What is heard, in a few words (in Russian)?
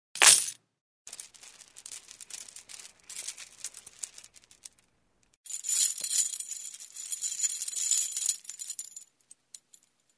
zvon tsepi